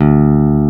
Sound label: PICK BS D2